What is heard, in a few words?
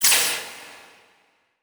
Title Transition Laser Short; Production Element